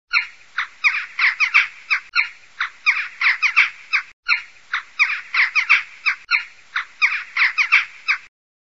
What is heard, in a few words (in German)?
Dohlen